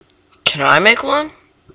aaaaaaa

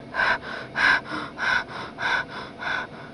panting

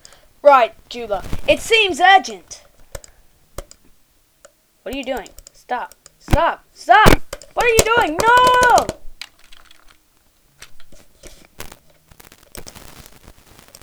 bloop

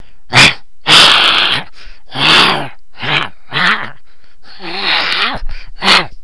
noise high grunt; Bionicle; rahi

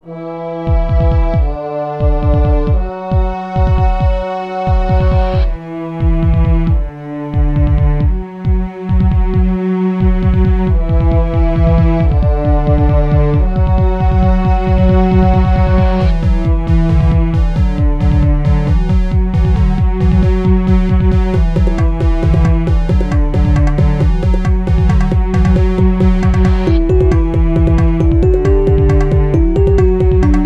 electronica

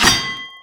grind metal off

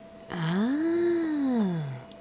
ahh

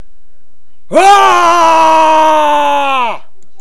scream

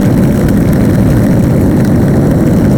roll asphalt

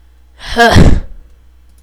sigh

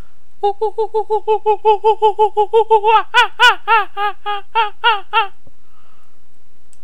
bird noise; Bionicle; rahi

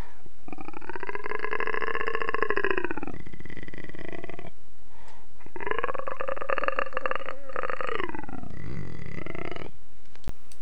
noise rumbling; Bionicle; rahi

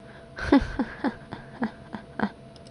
chuckle

